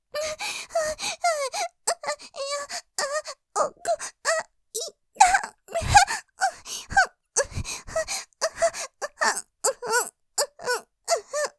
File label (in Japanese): 喘ぎ 更に激しく; 気持ちよく・ピストン押し付け; ループで楽しむ！シェフの気まぐれ抜き抜きコース; モノラル